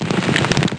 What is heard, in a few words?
flame; twitch-1.0